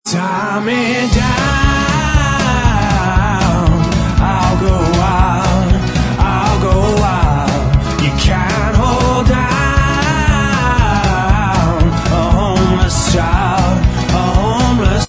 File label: backing vox